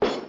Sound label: bottle down